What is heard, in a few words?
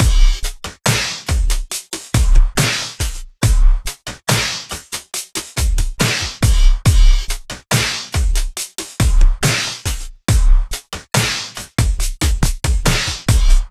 Slomo Beat 140 BPM, Loops, Slow Motion Beats; Vengeance Samples